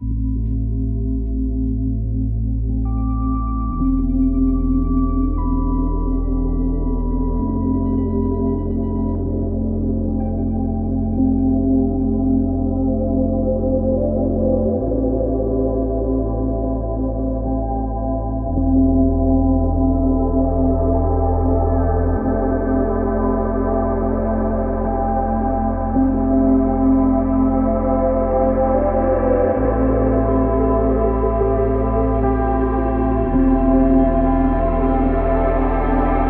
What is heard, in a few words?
keyboard